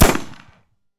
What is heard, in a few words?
Gunshot